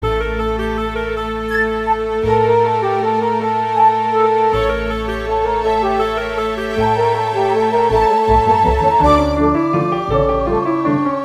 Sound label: Tarantella fast